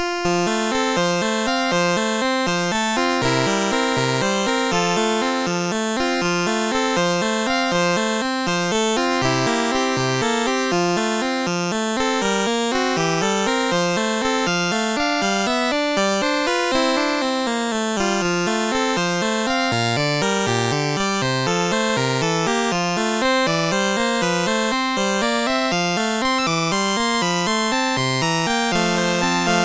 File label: MOS6581; MOS6581R4